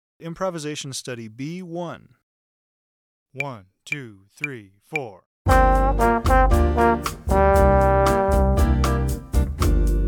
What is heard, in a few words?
bossa improvisation